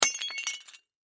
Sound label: Crash Ceramic Coffee Mug Drop Break; Crashes; Sound Effects:Objects; Blastwave